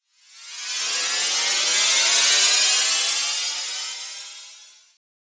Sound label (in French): gliss asc